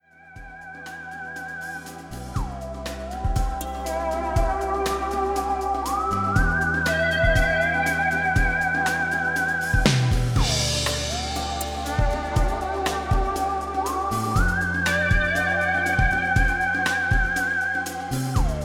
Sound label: Relaxing Guitar Dreams Hawaiian Mood